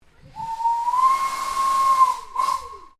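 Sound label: Train Steam Locomotive 0-2-0 Whistle; Kraus No5682; 1906; Vehicles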